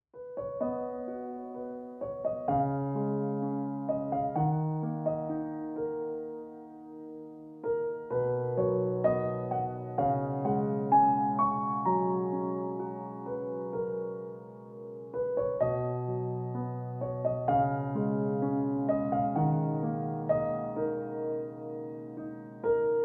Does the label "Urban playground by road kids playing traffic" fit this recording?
No